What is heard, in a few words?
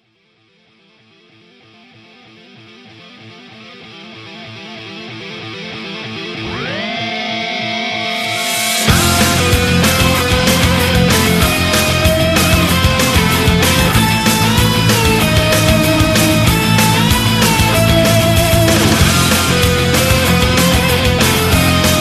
delta best ringtone